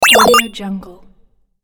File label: games retro robot bleeps